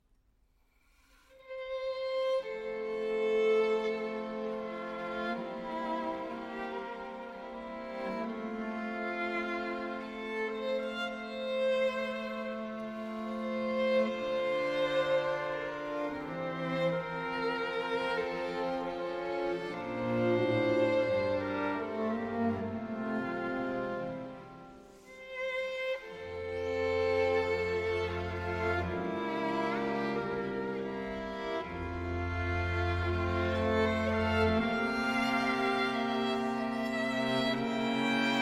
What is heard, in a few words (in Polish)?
Kwartet smyczkowy d moll Andantino; Moniuszko